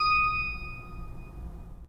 3D Pipe Mix Celeste, 3D Organs

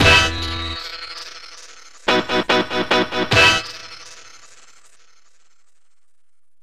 Static Hit; Sonic Foundry (Sony Creative Software); Club Joints and Street Anthems

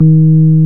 PNO, Rhodes Mk.V; Keyboards of The 60's and 70's

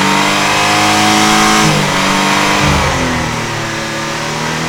multi rev, Engines; E-MU Producer Series Vol. 3 – Hollywood Sound Effects; Ambient Sounds

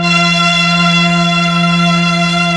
STR, M400; ILIO; Propeller Island Legendary M400

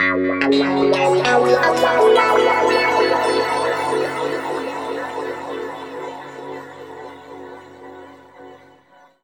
F# P-S WA -L, Guitar Samples; East West; Steve Stevens Guitar Samples Collection; PTCH-SHFT+WA

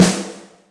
Room, Yamaha DTXpress, Acoustic Snare

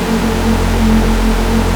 Prophet 5, F2; Hollywood Edge - Giorgio Moroder Rare Synthesizer Collection

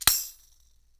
TAMSHA, EFFECT CYMB, 3D Drums; 3D Sound

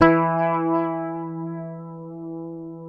Fingered bass E3 L; Sampleheads; Will Lee Bass Library

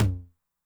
HI TOM, Noise, 909

